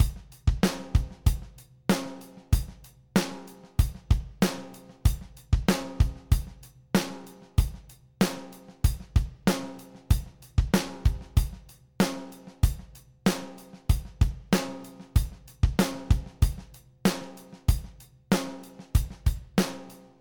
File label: Gretsch kit; toontrack the warehouse rock sdx